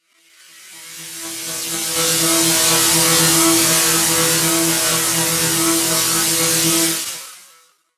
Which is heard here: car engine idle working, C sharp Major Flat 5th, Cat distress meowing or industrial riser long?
industrial riser long